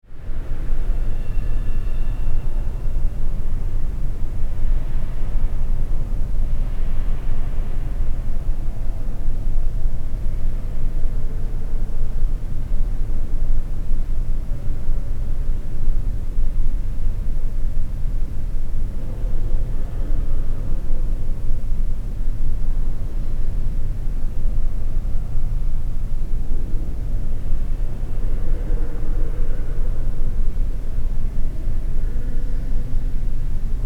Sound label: Fashion museum room tone with ventilation